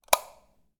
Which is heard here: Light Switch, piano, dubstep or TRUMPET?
Light Switch